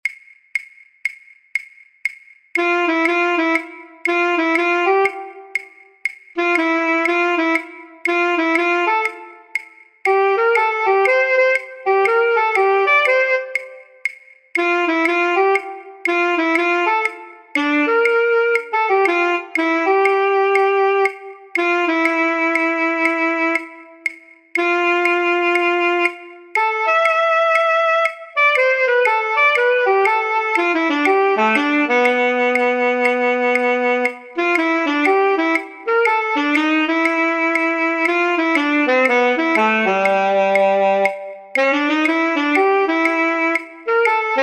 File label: solos 1, with a click; online orchestra